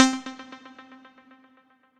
Pulse Hit, Synth Keys; Ableton; Glitch and Wash

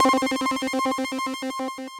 LFO, Synth Lead; Sonix LFO Zapata; Ableton; Factory Packs; Synth Essentials

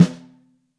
Top, Snare

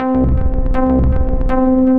Rubber Harp; Synth Keys; The Forge by Hecq; Ableton; Factory Packs

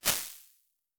Snare Room Long; Made for Drums; Ableton; Convolution Reverb

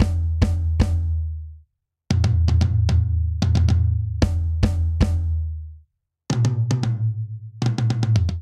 Polyrhythmic Dance Breakdown 114 bpm, Drum; MIDI Clips; Ableton; Factory Packs; Drum Booth

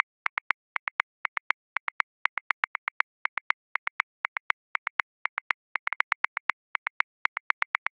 Straight Hip Hop, Drum Booth, Grooves; Ableton

